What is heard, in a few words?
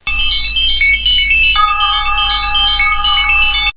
electrobells